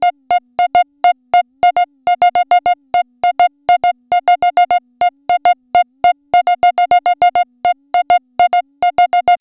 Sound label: Bleep Loop; Electronic Point Blank